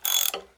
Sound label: Craft